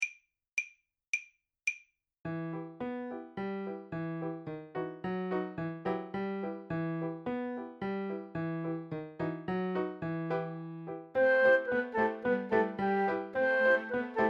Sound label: Piano Trios Horse Ride; Piano Trios no 1 boek 4